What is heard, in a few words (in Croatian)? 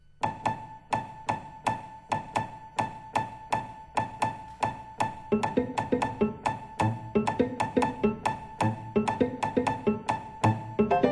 Jingle najava; Jinx